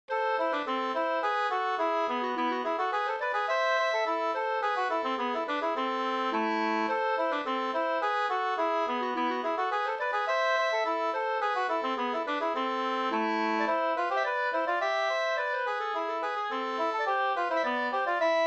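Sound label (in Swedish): slangpolska, two-part; Norrland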